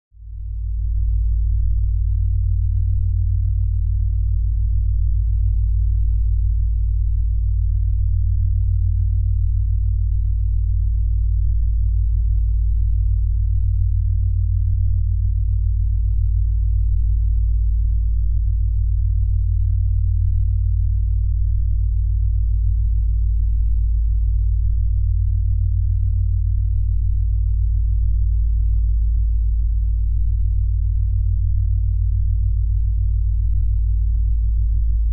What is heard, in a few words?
Alien Ship Ambience Low; Alien Ship Amb Low Mo